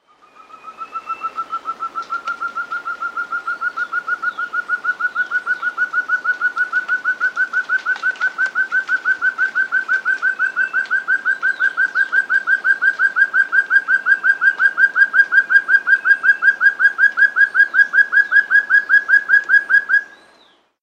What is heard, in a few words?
Barred Antthrush; S. America